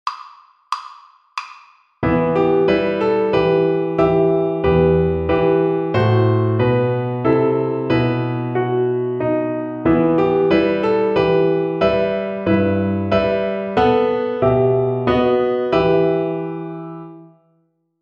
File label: String Gym, Piano Accompaniments; Etude 29 - Mini Minuet in E minor